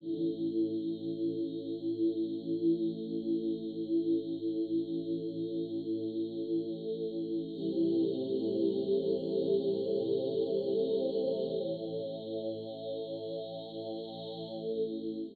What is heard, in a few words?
synth pad, ethno, 125, A; eJay; Sphere